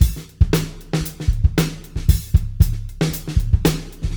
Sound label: Beat Loops; Zero-G; Monster Beats